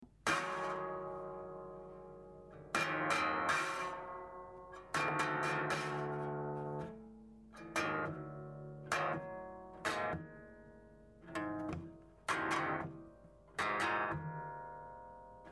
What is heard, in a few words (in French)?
tige frap, harpe